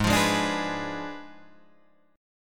G sharp Diminished 7th G sharp, Guitar Drop D

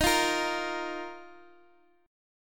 D sharp Suspended 2nd, Guitar, Open G

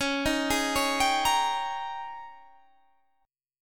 Ab Major 7th Suspended 2nd Suspended 4th Db

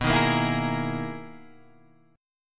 B Major 13th B Guitar Open G

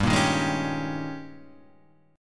D sharp 11th G

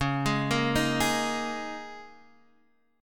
D sharp 7th Suspended 4th

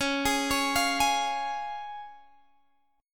C sharp Major, Guitar, Standard; arpeggiated; JGuitar

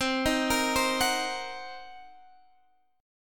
C Minor 7th Flat 5th, Guitar, Standard; Cm7b5 arpeggiated; capo=3

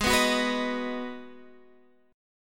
Ab Minor 7th down Guitar Standard; strummed; JGuitar

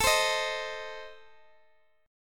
A Diminished A Guitar Standard, chord; strummed; JGuitar

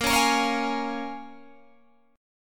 A sharp Minor 7th, A sharp, down, Guitar, Open G; A#m7; strummed

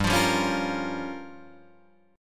G Minor 7th Flat 5th, Guitar Drop D

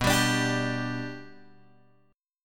B-Minor Sharp 5th, Guitar, DADGAD

